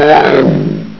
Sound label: alert, zombie; svencoop; classic; zombieman